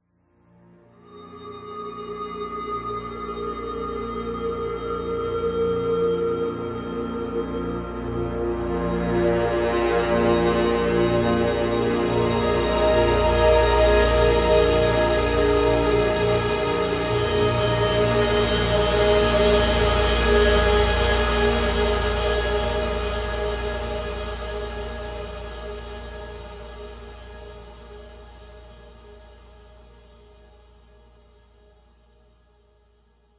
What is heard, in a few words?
sound design cinematic orchestral swell dramatic tense horn ensemble crescendo; into strings; zapsplat